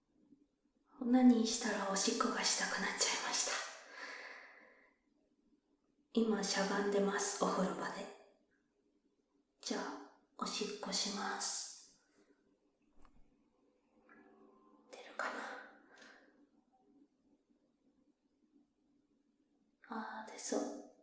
お風呂場, ステレオ

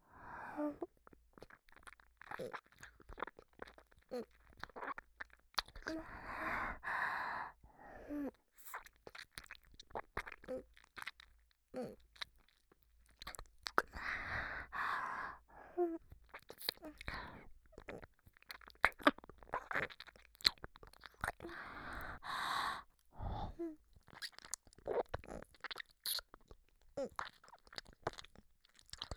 はーむ…ぷぁっ…, 左耳

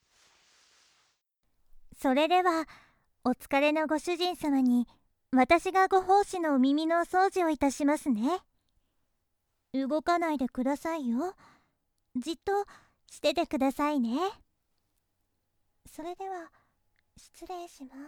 ご主人様のお耳、綺麗にしていきますね(左), ASMR

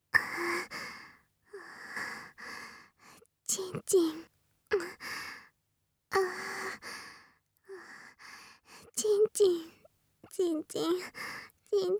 喘ぎ, ASMR

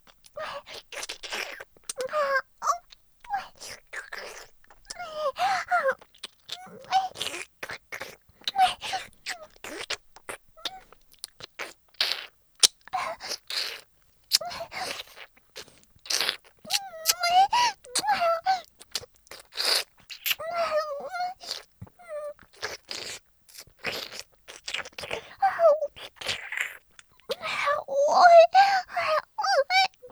フェラ; 音声ケモノ仕様！; フェラ増しスパイス！

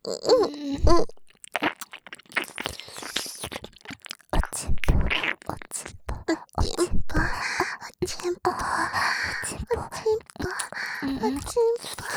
耳舐め喘ぎ; お屋敷メイドのハーレム淫語ヘヴン 気が済むまで耳舐め淫語を堪能できるバイノーラルオナサポ音声

